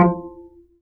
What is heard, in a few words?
D STRING UPRIGHT BASS, Upright Acoustic Bass